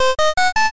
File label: chain